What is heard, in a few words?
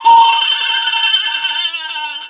Laugh; Wizard Mini